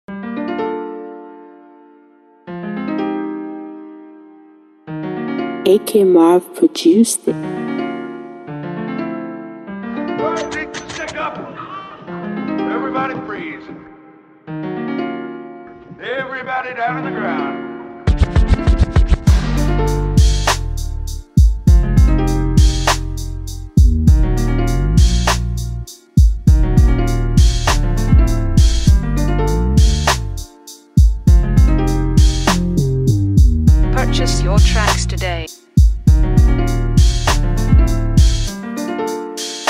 trapsoul instrumental, type beat; Prod. By AK Mar

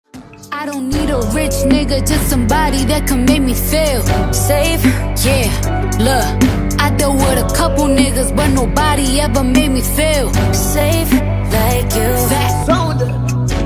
OPEN VERSE Instrumental BEAT HOOK; By Elitunes

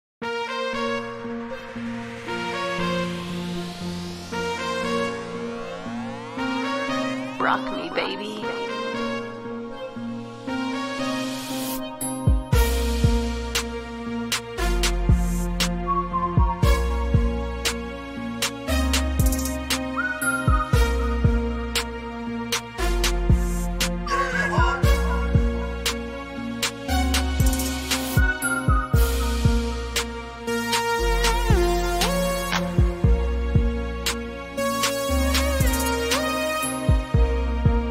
Type Beat

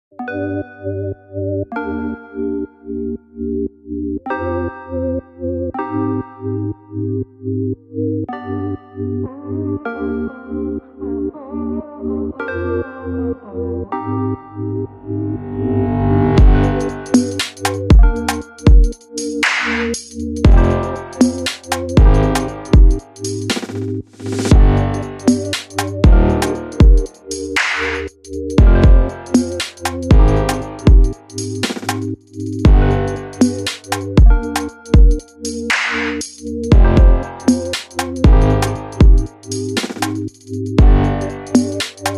Karaoke Version

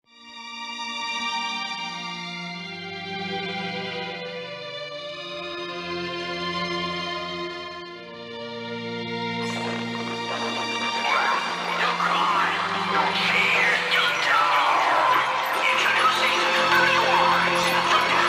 Cinematic Trap Beat; Prod. by Dices

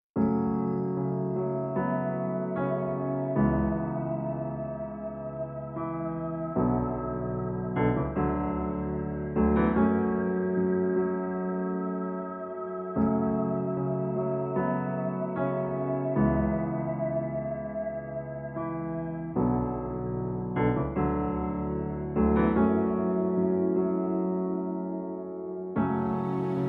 Karaoke Instrumental

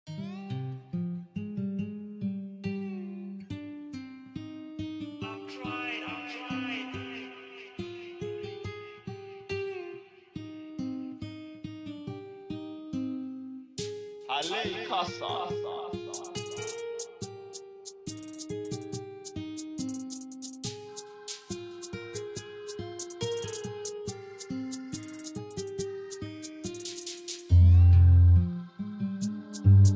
instrumental, drill type beat

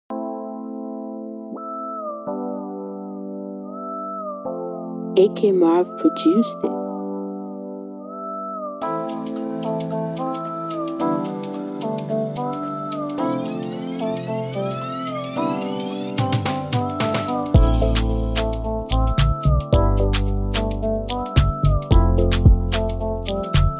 Afro Pop Instrumental Type Beat

